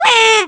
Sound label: Miniblin Hooked